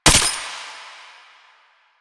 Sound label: Pistol Last Shot; Weapons; Warframe; Tenno; Lasrian